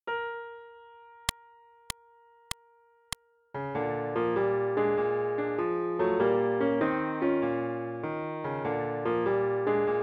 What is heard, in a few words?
Bari Accomp